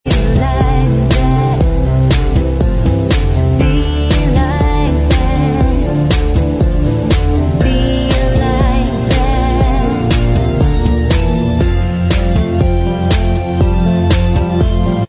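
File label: backing vocals track, backing tracks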